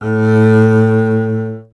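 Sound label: string contrabass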